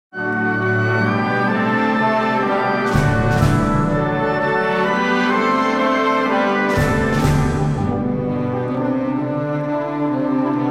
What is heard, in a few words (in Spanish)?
himno europeo, para banda; CANCIÓN DE LA ALEGRÍA - HIMNO EUROPEO (Europahymne) (European Anthem); Fernando Bonete Piqueras